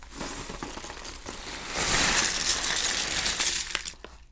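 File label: cereal; eating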